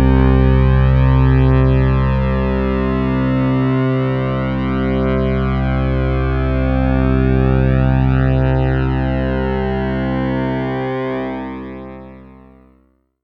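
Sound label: STR C2, Roland MKS-80 synth strings; Pads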